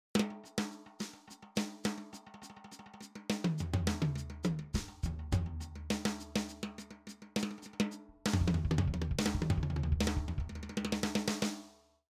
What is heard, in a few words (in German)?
Maple Rutes; Simmons SD1200